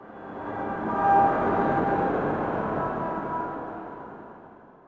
city, zones; Zoom3City

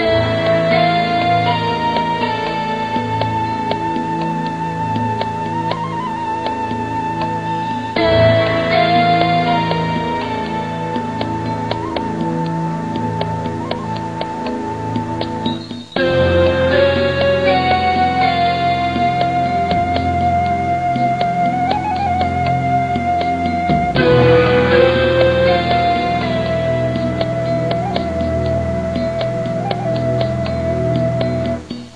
Oriental; tangram

